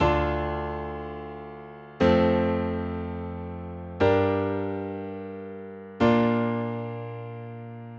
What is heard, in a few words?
midi chord pro; آکوردهای میدی – Midi Chord Pro